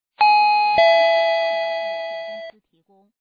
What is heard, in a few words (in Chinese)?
叮咚一声, doorbell